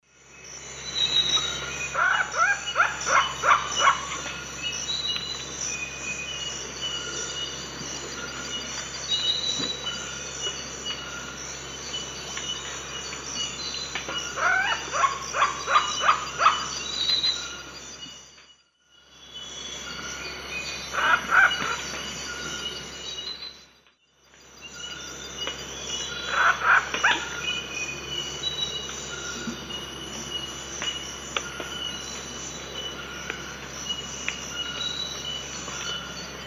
Celeus elegans, calls; S. America; Eastern Lowlands

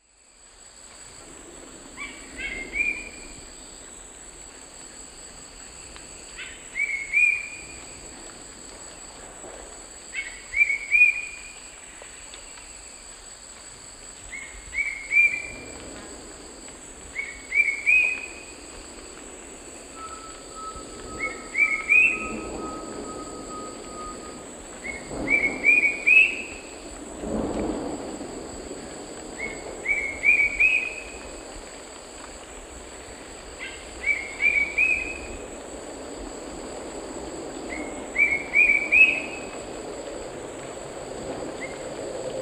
Attila spadiceus, alternate song; S. America; Eastern Lowlands

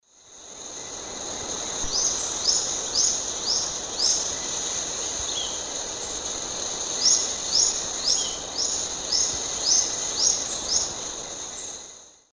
Myiobius barbatus, song; S. America; Eastern Lowlands

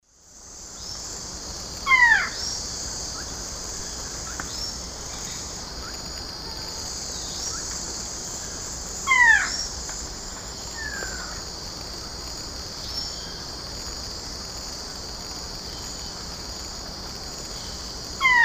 Thamnophilus schistaceus, call female; S. America; Eastern Lowlands

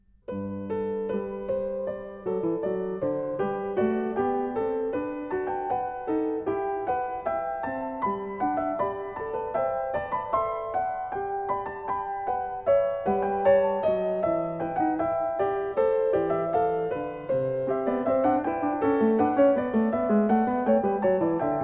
Variatio 9 Canone, all Terza a 1 Clav., Piano; JS Bach - Goldberg Variations

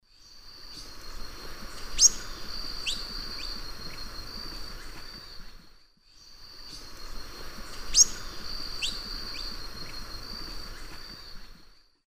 Thraupis palmarum, flight calls; S. America; Eastern Lowlands

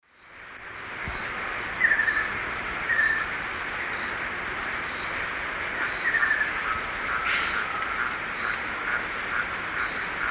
Monasa nigrifrons, call; S. America; Eastern Lowlands

